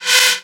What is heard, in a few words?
Synths B; vengeance essential club sounds-3